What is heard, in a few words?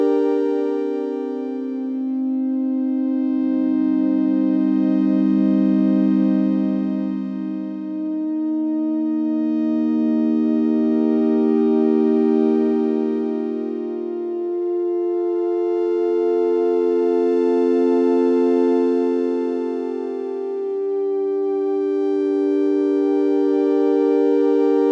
pad